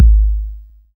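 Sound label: bd, 808 bass drum; Best Service Gigapack 2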